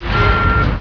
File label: alarm